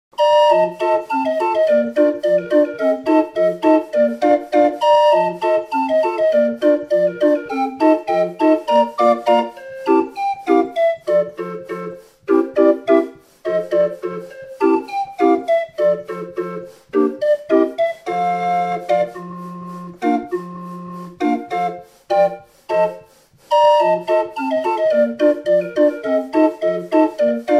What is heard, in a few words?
Pizzicata Polka